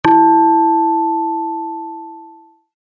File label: tone